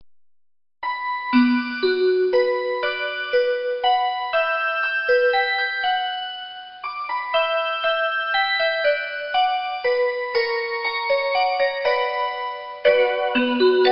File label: Waltz